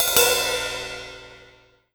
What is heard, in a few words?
Crash Flink Short; TheBlackPearlV1; pitchglitch